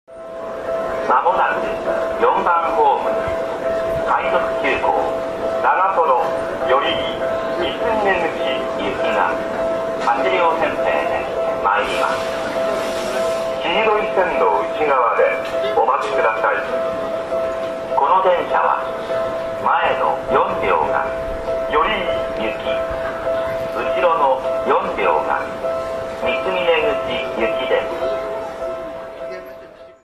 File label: arrival, rail sounds; Seibu